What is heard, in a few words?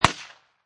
Machine Gun Uzi Model A Single Shot